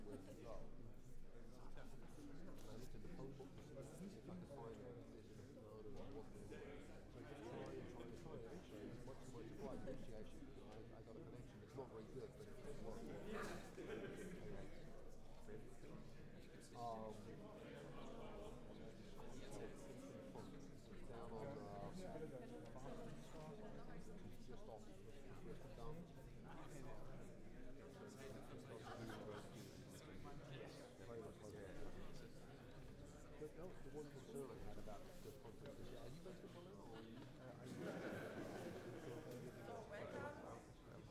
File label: concert ambisonics